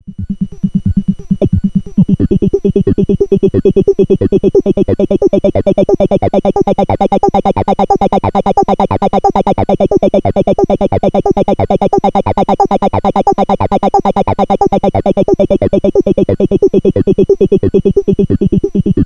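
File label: ARP Avatar VCF FM Amount via WHEEL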